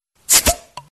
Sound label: bottle open ringtone